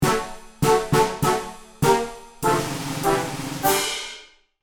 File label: Fanfare you lose fail game fanfares; misc musical